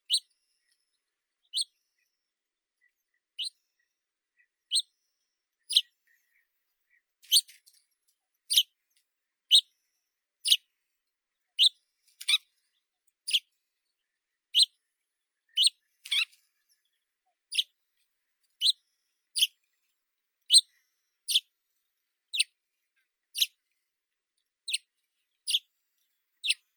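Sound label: saxaul sparrow, Passer ammodendri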